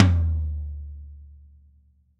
hi tom drum one shot d key

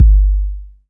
long room reverb bass drum single hit g key

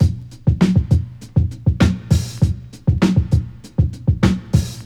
99 bpm drum loop f key

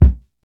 bass drum single hit c key

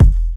hip hop steel kick drum sound f key

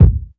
smooth kick sample a sharp key; Mono; kick drum samples

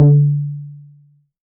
subtle reverb tome drum sample d key

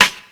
old school hip hop snare drum sound g sharp key

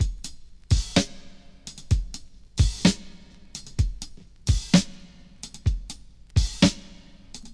76 bpm drum groove a sharp key

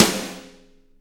long steel snare drum sound c key

